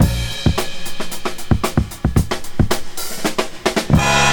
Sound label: Drum loops, Funky